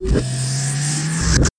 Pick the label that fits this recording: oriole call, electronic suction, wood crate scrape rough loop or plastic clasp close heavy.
electronic suction